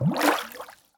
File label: water bubble; sonniss gdc